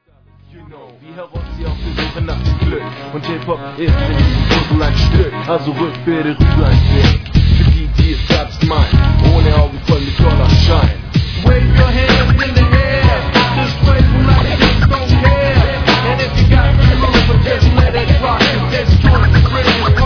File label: hiphop